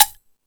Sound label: Percussion FX, E-MU Pro-Cussion